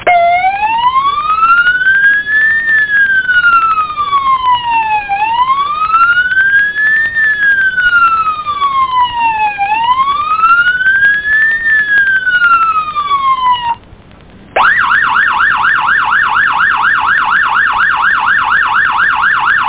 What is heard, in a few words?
Siren